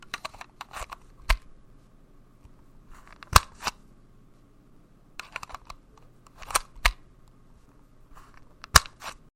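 inserting and removing a game cartridge Game Boy Advance from the console GBA, computer sounds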